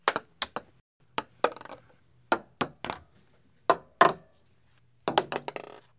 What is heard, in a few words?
Ping Pong Fallings